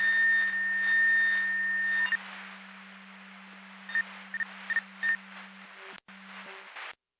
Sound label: Siren; Hamburg FD